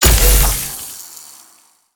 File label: p atk ropelauncher